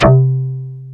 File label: DS8 C 3, Korg